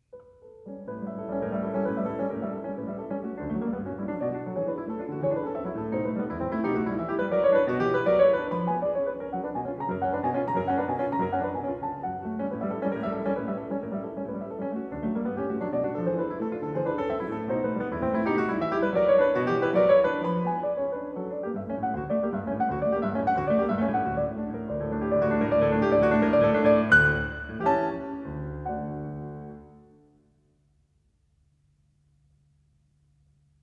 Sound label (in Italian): Preludio in re maggiore, op. 28, Piano; Chopin - Piano Works - Vladimir Ashkenazy